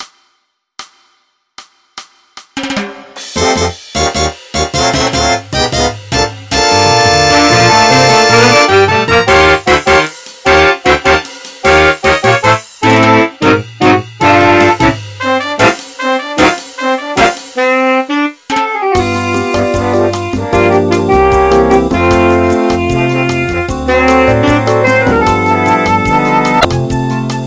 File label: Jazz